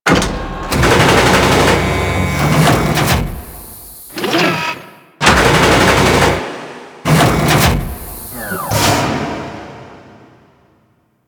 Shipyards Crusher Arm; Ambience; Props; Warframe; Grineer Shipyards

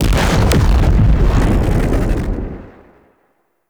Anchor Rewind Explosion; Warframe; Protea

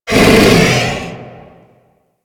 Infested Bone Blade Vocal Idle B; Warframe

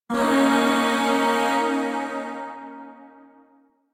Shawzin Yo Chord K; Music; Chords; Warframe; Zariman Void Shawzin; DE

